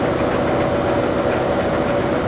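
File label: block lift loop; movers objects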